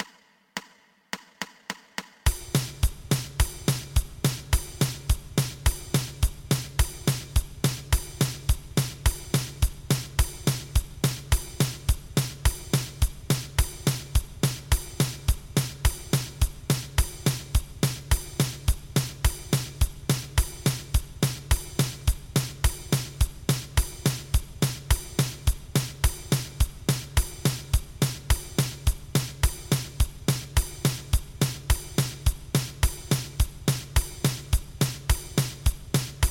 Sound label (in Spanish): 2 pulsos